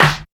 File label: sharp snare single hit D key